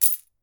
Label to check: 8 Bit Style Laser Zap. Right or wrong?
Wrong